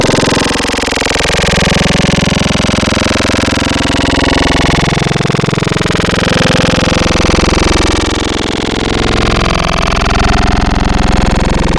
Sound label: Growl Tables; Levitate; Cymatics; Growl Tables Vol 2; Serum